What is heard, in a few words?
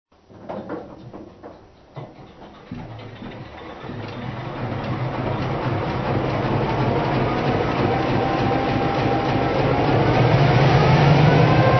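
EMD GP-7, locomotive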